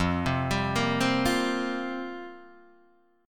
F Suspended 2nd Suspended 4th F down Guitar DADGAD